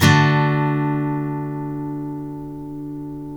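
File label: steel D major up, CHORDS STEEL, Guitars; Spectrasonics; Hans Zimmer Guitars VOL-1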